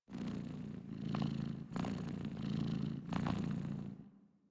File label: Kitten Purr; Enemies; Warframe; Catbrow